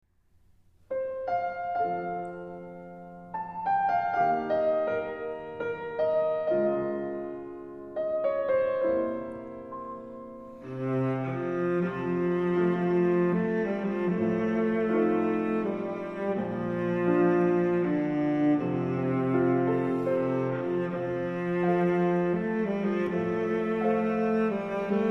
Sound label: melody